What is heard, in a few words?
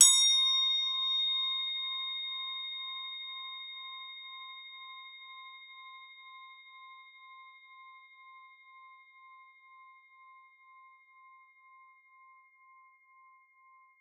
crotales C4; Xsample VOL-4